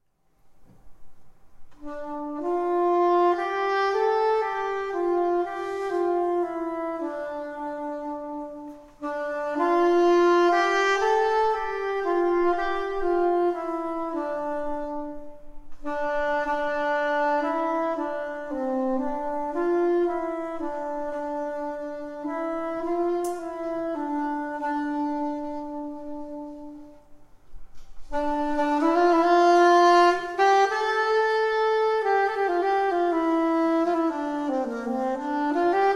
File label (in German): Improvisation über Alleluja Sopransaxofon